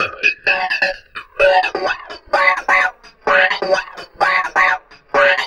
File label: PHUNKY TALK, TALK BOX; Spectrasonic Distorted Reality 1